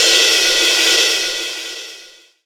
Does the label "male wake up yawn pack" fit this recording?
No